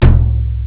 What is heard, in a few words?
taiko; chipmusic; sonix; Stellar 7